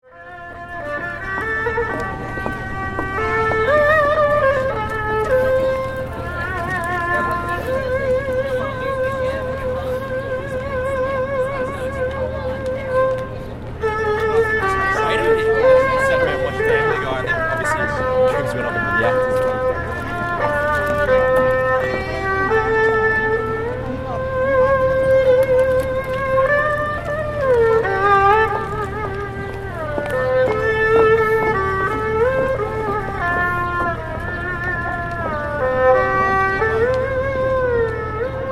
Ambience Melbourne Busker Chinese Erhu; Melbourne Buskers; Concert Sound Effects; Concerts (Sound Effects:Backgrounds); Foundation1

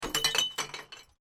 Bottle Plink, Glass; Materials; Crafted Signals

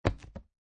Cards Playing Cards Dealing On Felt; Playing cards, poker, dealing cards on felt. Dealer taking cards from hand and putting on felt.

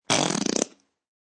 Fart; Farting sound; Human

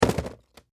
Cardboard Box Dropped Foley Small Package; Miscellaneous Impact Sound Effects; BBFX

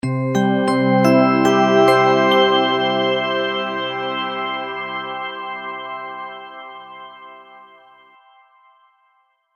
multimedia logo, Musical Identity/Signature

